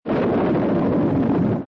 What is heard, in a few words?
SONIC BM